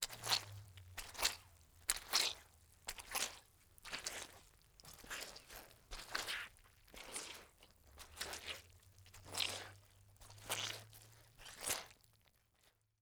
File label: Liquid Water Towel Walking On Wet Towel